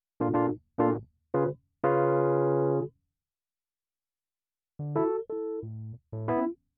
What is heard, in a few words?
Keyz R